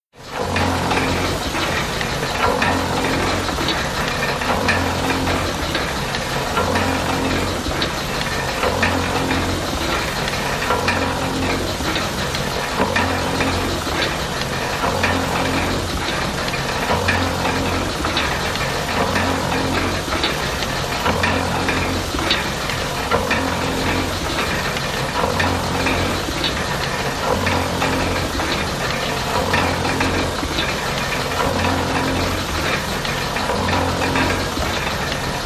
1 Cylinder Engine Water, 1940s 1 cylinder lister engine